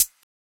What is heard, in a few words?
Closed Hat